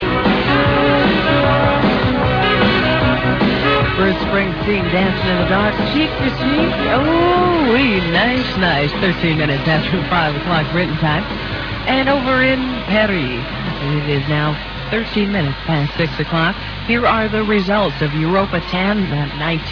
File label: laser